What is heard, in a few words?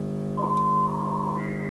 hayabusa beacon